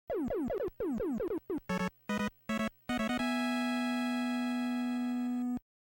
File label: stage clear; mega-man